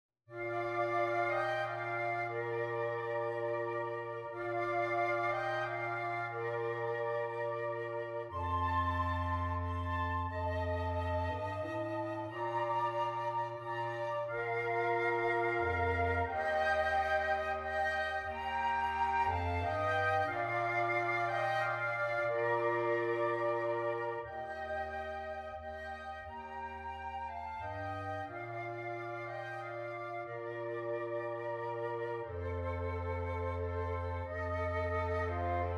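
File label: Silent Night flute flute bass clarinet; Christmas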